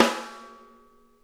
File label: RIM, snare; Sonic Reality; Interactive Drum Kits